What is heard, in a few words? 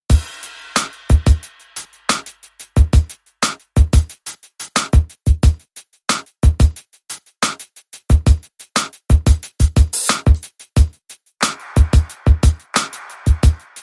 Infestation Beat